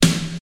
Classic Clear Snare E Key, Royality free snare drum tuned to the E note. Loudest frequency: 2107